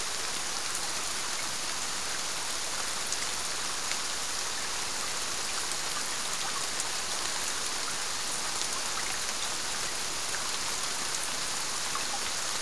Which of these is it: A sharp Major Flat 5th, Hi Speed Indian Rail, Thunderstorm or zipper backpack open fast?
Thunderstorm